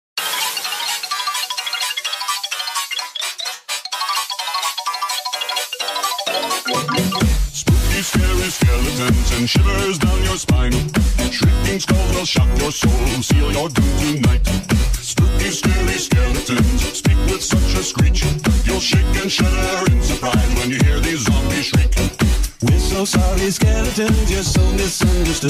Dance Remix; toque

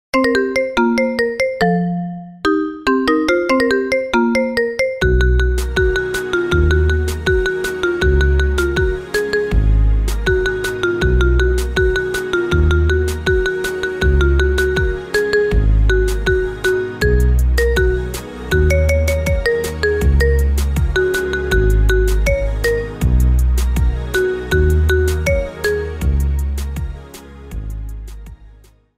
Marimba

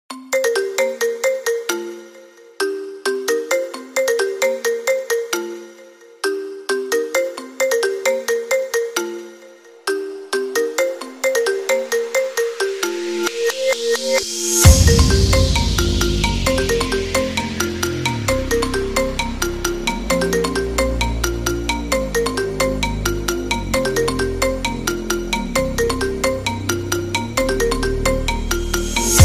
iPhone 13 Original

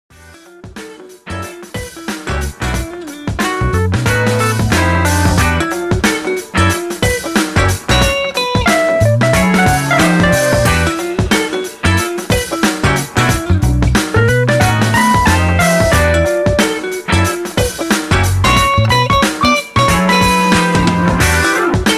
Nokia Change

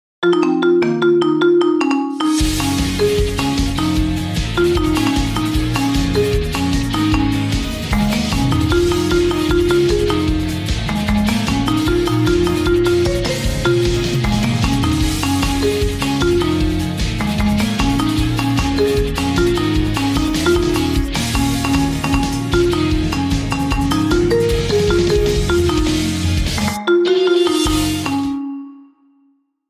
Marimba; Jujutsu Kaisen S2

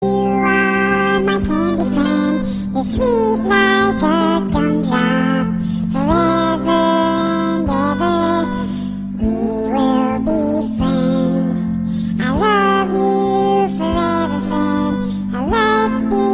Forever chipmunk

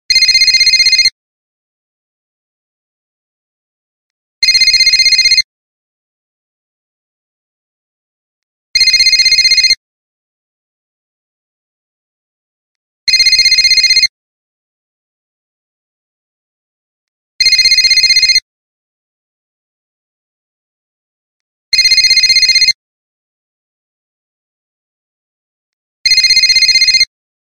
Motorola Razr V4

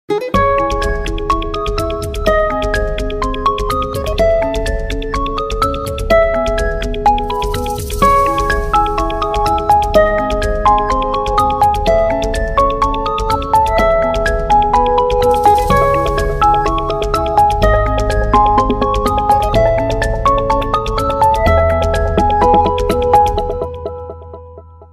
Nostalgic Oppo ColorOS 7

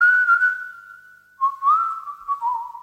Simple Whistle SMS

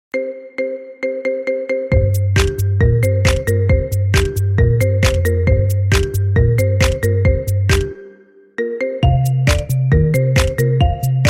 Marimba

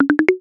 Pear

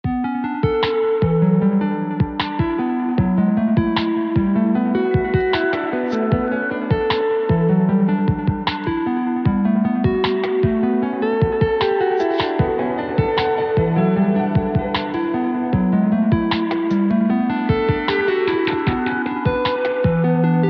Samsung Galaxy A04s

